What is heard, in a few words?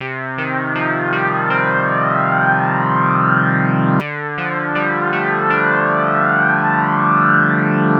First Key Flanger